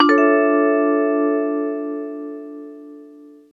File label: TV Suspense Vibez D, Lounge 135; Zero-G Kocktail Kollection; Construction Kitz (Lounge); TV Suspense Mixes 135